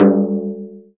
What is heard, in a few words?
INDIAN DR C#2; Spectrasonics; Heart of Asia; INDIAN SET